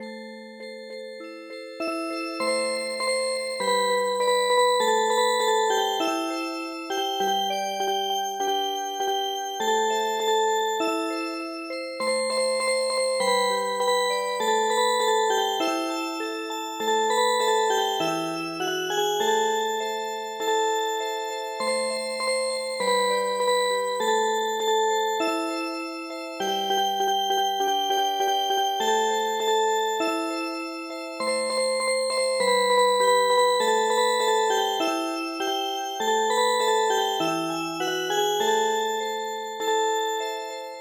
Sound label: Music Box; midi; traditional